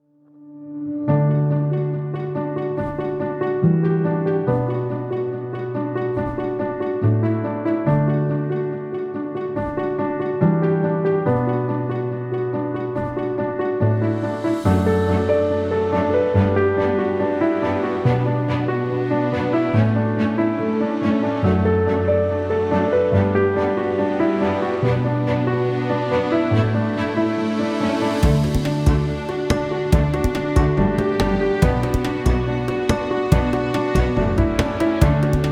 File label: Gentle Piano